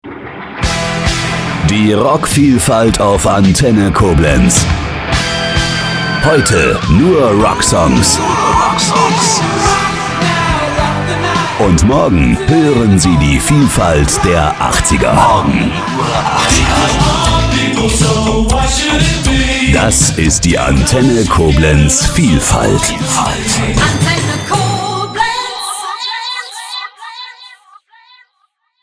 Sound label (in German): rockvielfalt